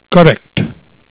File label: correct